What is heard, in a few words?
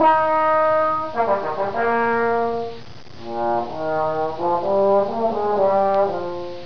trombone